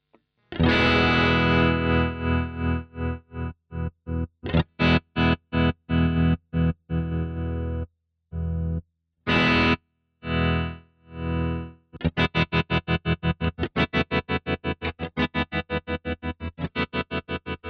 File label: ZZOMBEE Tremolo